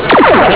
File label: laser